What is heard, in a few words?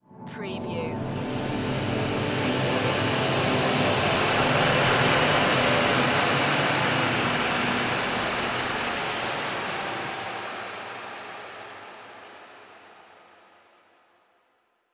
Swell Airy